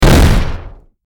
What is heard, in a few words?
bomb explosion